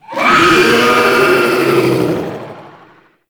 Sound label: Tau Sentient Dactolyst Use Ability; Warframe